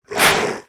DAMAGE

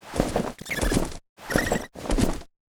THORNASH WAR IDLE

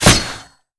Sword Metal, Damage

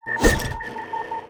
BLADE PAWN WAR ATTACK

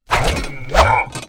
STONEKNIGHT WAR ATTACK

